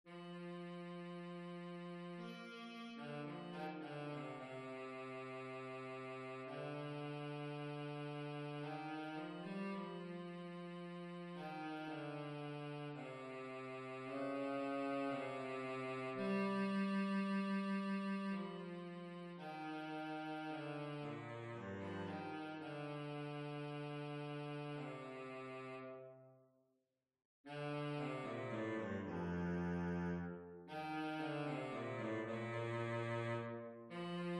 Bari Sax Track

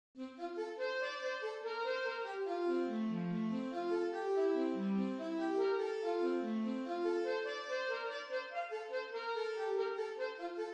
Alto Tenor Sax Slow

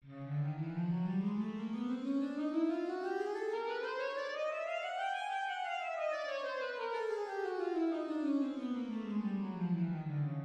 Alto Tenor Sax Chromatic

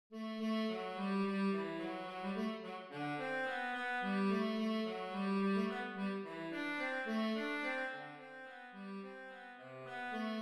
Bari Sax Slow

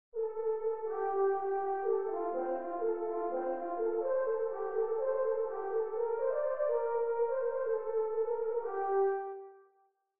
Horn Excerpt

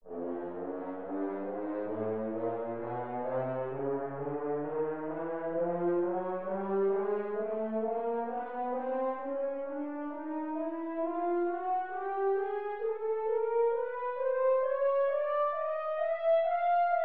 Horn Chromatic Scale

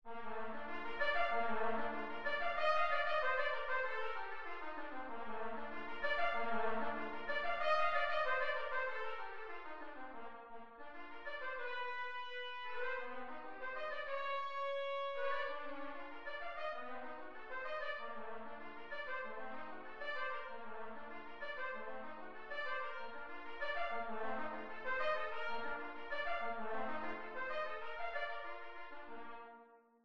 Trumpet Track

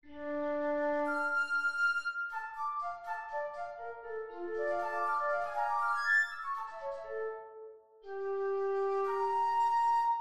Flute Track

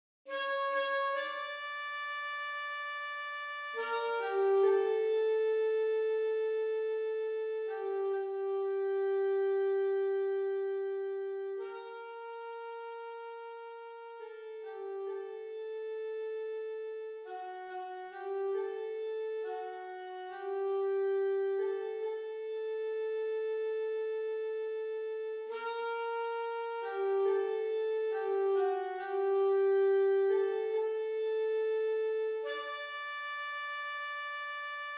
Sax Excerpt

